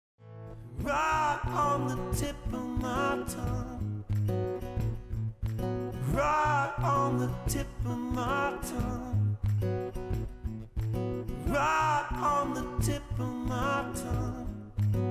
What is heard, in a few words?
Melodica Duo